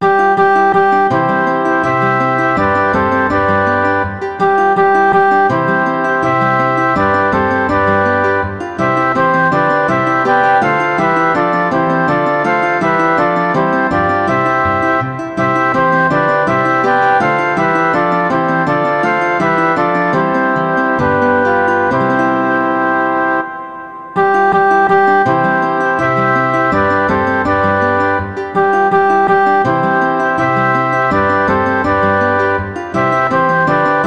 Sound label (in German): 3 floeten 2 git bass; kein schoener land